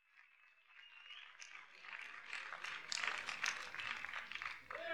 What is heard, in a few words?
Applause